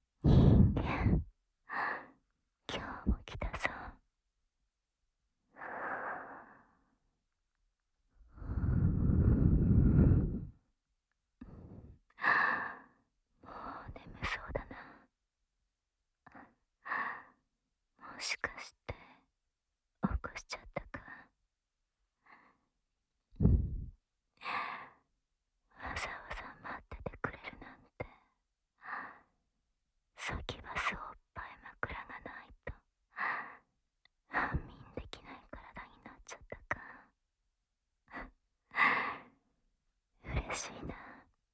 吐息, 寝息; 密着囁き!おやすみ★さきゅばす～お姉さんサキュバスによる寝かしつけ無声囁き～

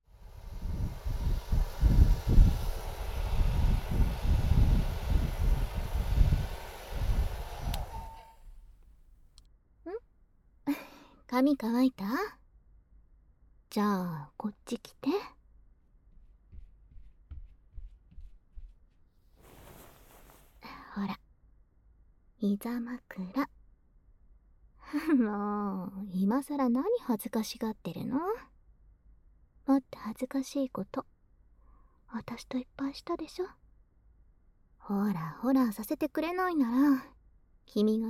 お風呂上がりのイチャあま耳かき; 悪戯好きのお姉さん

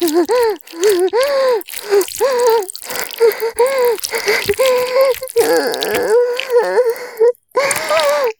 おしっこ; 絶頂+潮吹き+中出し ランダム再生

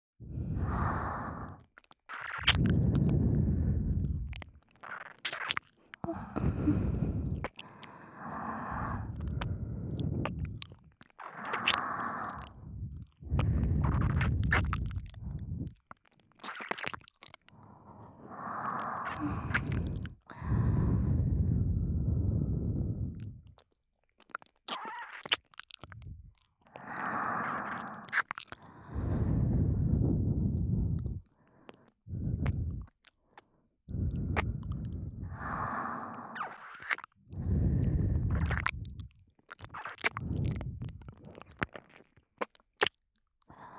両耳舐めループ; 両耳密着囁きメイドのオナサポご奉仕♪～坊ちゃまのお◯んちんのイライラは全部私達が解消致します!～

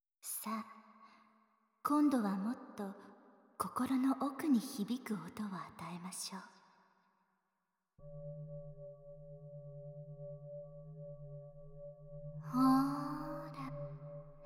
脳感とろとろ耳舐め; リビドーオーガズム; キメオナサポート～脳イキトリップ体験～

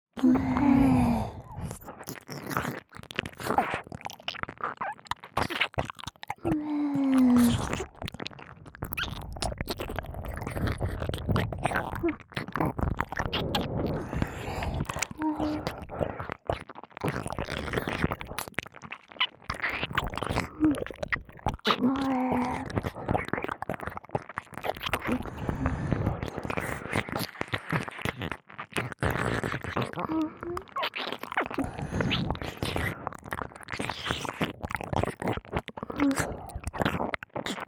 耳舐めループ; エッチなお姉さん達の両耳舐めお〇んちんお仕置きトレーニング

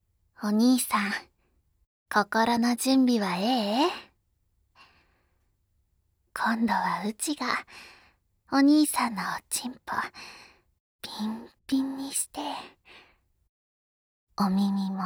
耳舐め 全身リップ チン嗅ぎ 生フェラ 素股 生ハメ オホ声 SE無し; 遊郭で見つけた関西弁の天使～感極まり濃密えっち!遊女の連続アクメ、サービス生ハメ、オホ声喘ぎ～

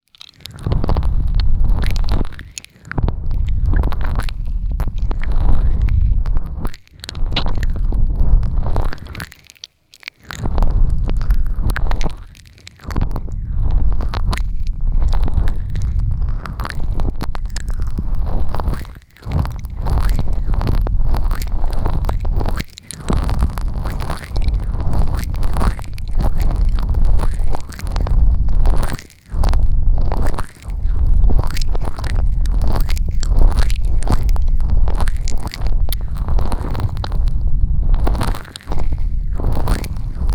ピストン MIX 両耳, ASMR

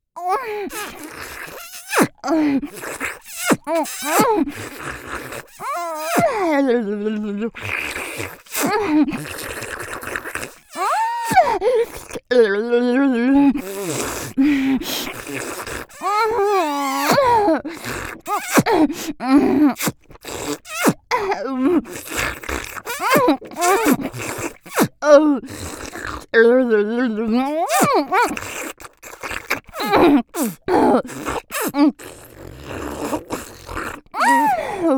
手マンコとひょっとこバキュームフェラ フェラ, ASMR; ギャルフェラッ!!学校は援交ギャル風俗店ッ!!

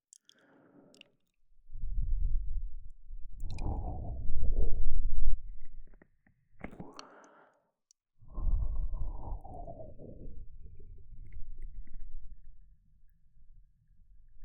左右交互スロー耳舐め, 声なし, ASMR; ASMR女子のための寝る時に聴くスロー耳舐め

